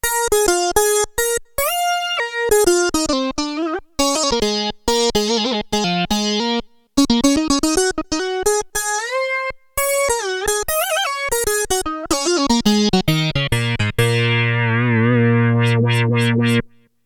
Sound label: LEAD-SYNTH III, Korg DW-8000; Factory Patches